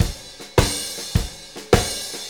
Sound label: Blues Loop, Full Kit Loops; Drum Tools